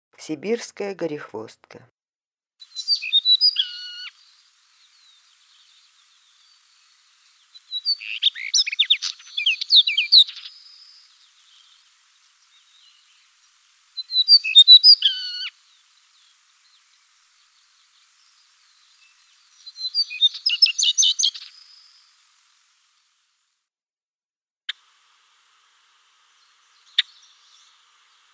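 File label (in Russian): sibirskaya gorihvostka voice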